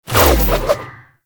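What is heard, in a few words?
Dodge Start; Enemies; Abilities; Warframe; Tau; Dax Ninja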